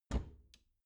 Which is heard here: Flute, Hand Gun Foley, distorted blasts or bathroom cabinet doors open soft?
bathroom cabinet doors open soft